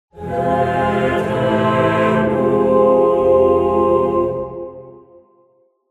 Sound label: Battle Sting; In Death VR; Battle Aeternum